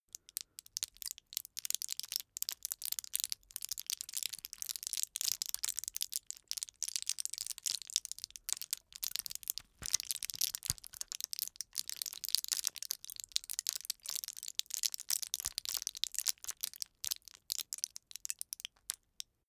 plastic drug blister bend crackle

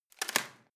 rotten wood break

